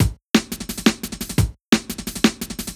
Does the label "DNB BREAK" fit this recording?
Yes